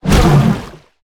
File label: creature flinch swim